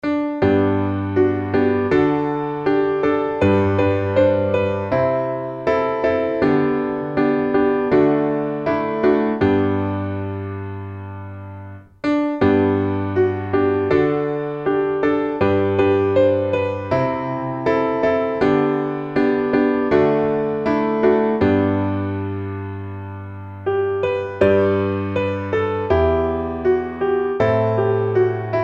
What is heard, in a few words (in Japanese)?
ookina furudokei piano